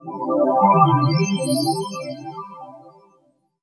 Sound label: friend; MANALINK